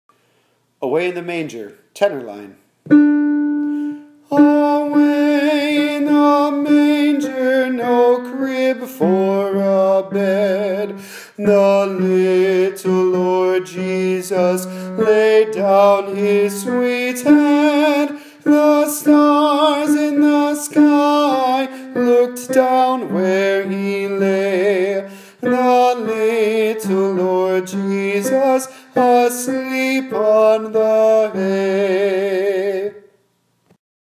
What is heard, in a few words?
tenor